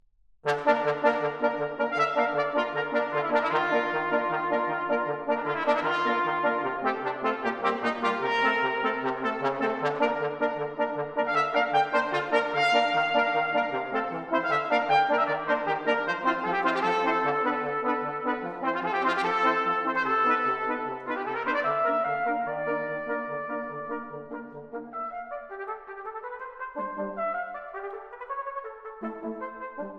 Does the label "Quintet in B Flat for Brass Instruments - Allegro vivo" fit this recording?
Yes